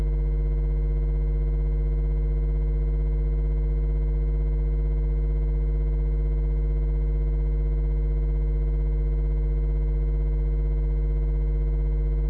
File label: Hall